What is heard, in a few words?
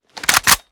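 foley assault rifle akilo47 reload empty chamber; weapons; viper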